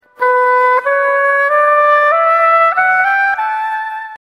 oboé

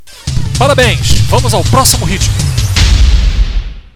acerto; PGTIAE 2011 - Ritmos Musicais

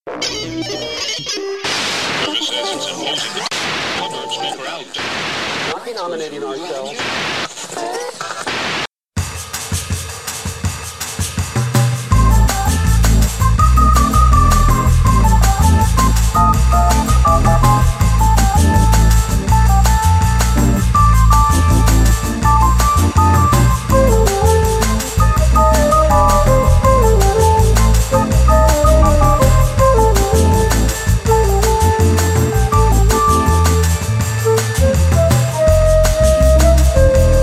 trecho bossa nova; PGTIAE 2011 - Ritmos Musicais

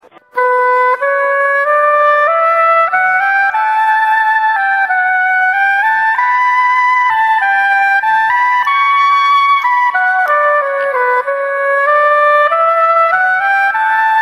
oboé; instrumentos

